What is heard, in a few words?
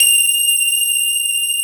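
grand piano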